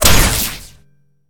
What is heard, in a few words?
metal; combat; weapons; default shootable